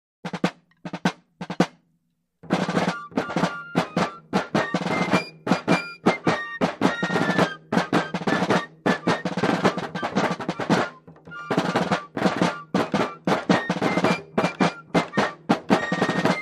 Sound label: Fife Drum Band